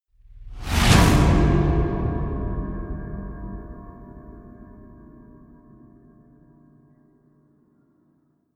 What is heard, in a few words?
metallic slams with whoosh; hotgate